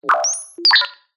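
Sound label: science fiction robot small cute chirp vocalisation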